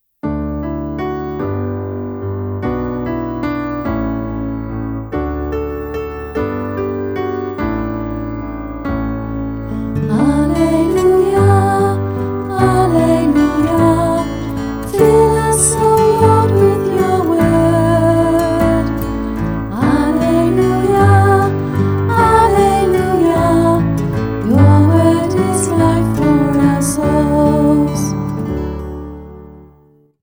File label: Gospel Acclamation